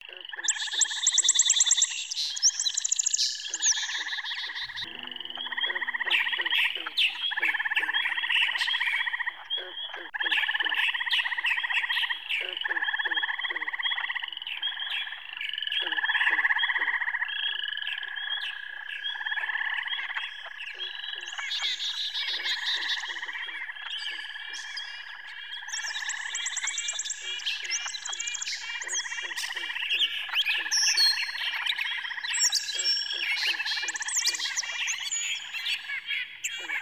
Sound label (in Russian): zvuk ptic v lesu